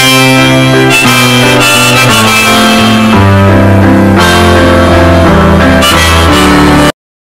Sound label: sad accordion